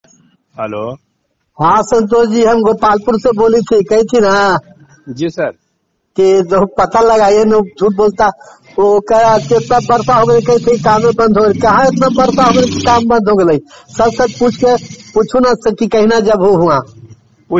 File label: pipe